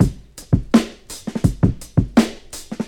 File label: Drum loops, Boombap